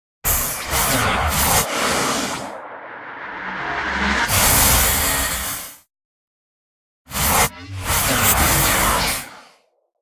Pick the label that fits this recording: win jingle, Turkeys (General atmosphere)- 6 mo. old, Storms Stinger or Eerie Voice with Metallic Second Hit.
Storms Stinger